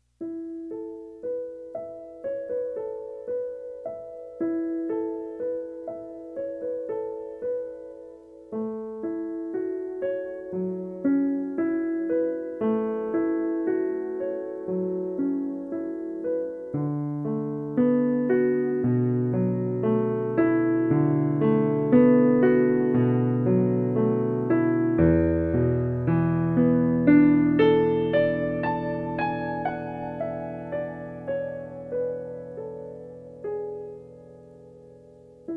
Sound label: Distant Chimes